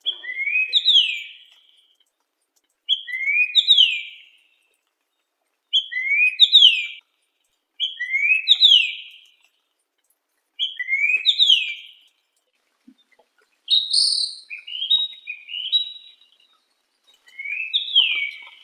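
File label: large black-headed grosbeak, Eophona personata; dubonos bolshoi chernogolovii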